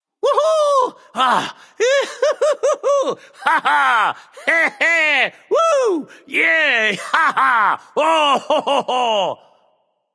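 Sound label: non verbal yay, voice; cernunnos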